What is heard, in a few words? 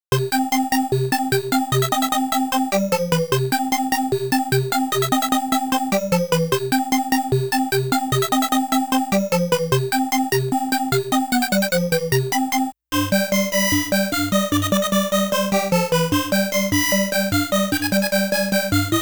Overcharge music; Libble Rabble